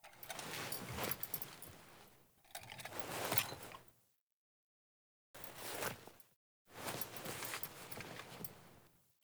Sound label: Foley Actions Default Remove Vines, Default Clothing; Characters; Player